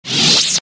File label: saber off quick